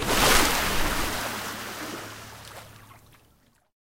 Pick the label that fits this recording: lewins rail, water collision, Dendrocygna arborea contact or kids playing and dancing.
water collision